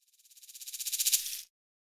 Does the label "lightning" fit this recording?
No